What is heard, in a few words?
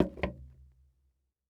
Foley Footstep Golf Cleats Single Step On Wood Board Walk Slow; Foley Footsteps